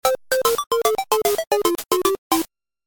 Stage Clear Theme

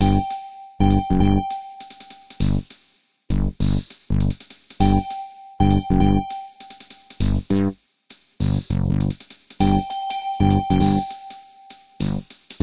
Boost Pad Bonanza Intro

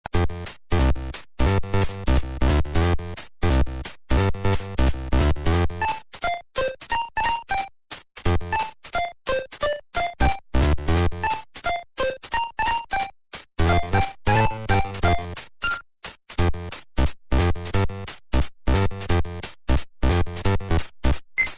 Forest

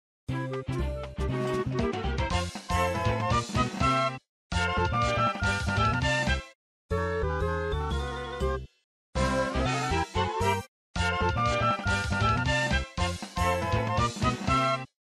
Mona Jingles; WarioWare Gold

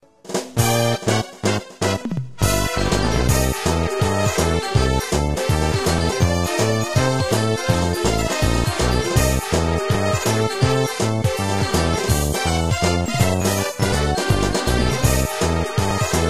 Music, Mini-Game; Mario Party 3; Game Guy

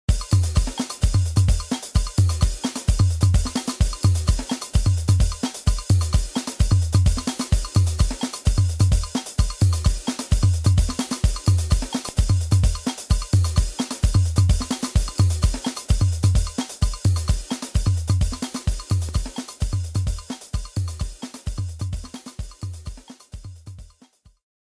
Time Grows Nigh

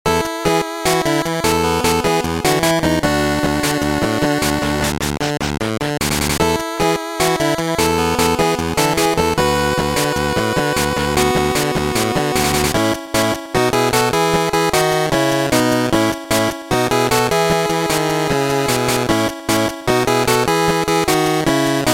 Golf Training Mode

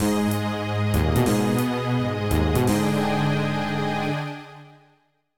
Boss Lose; Coaster Hills

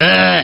grunt; Krunch

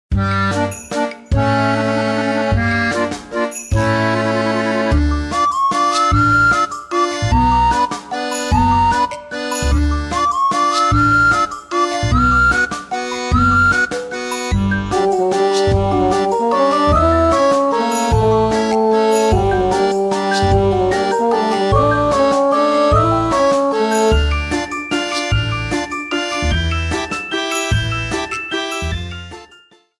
Horror Land Day

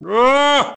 evil laugh; K. Rool

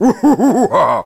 laugh; K Rool's

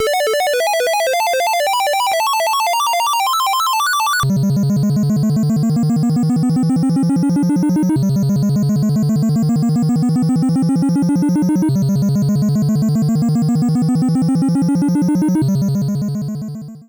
Pinball Ball Saved BGM

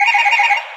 Horn; MK8; Baby Luigi

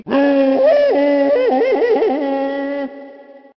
Donkey Kong Tarzan Yell

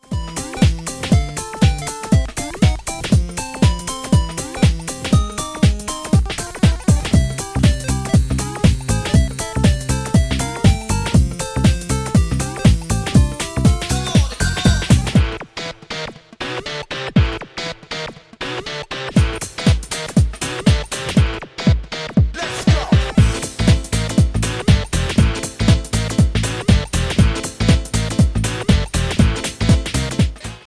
Instrumental; Supermarioland

